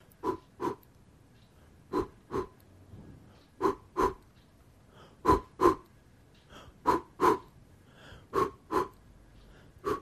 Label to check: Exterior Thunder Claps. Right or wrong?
Wrong